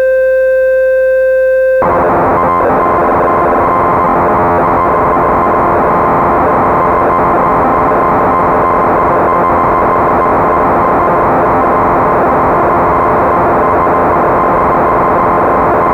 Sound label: Crumar Bit One